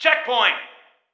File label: Checkpoint; Test Drive Off-Road 3